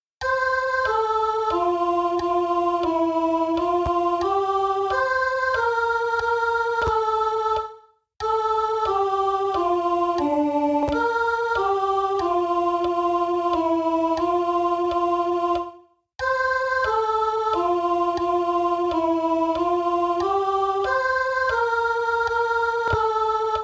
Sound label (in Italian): Hai dato un cibo Sweet sacrament, SOP